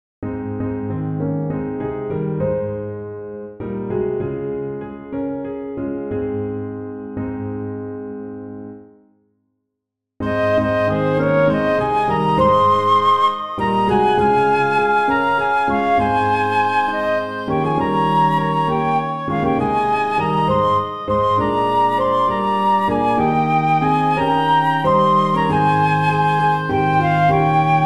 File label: Piano; I Come to the Garden Alone; In the Garden; 3 verses